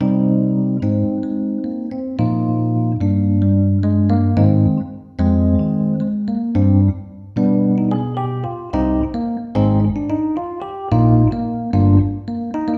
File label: vintage key